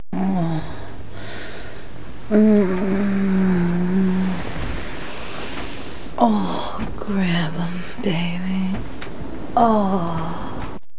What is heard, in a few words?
MOAN